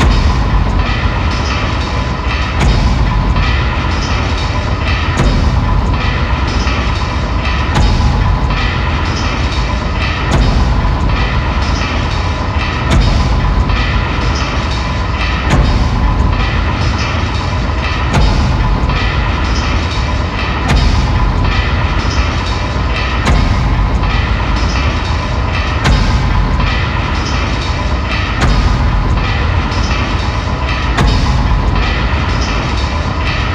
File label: Floor Elev Drive Loop